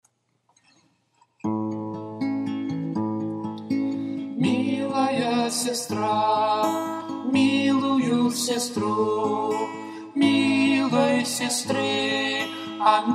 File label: Song, Russian Songs